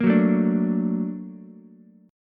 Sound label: A sharp Augmented Major 7th A sharp Ukulele; strummed; JGuitar